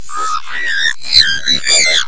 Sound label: Alien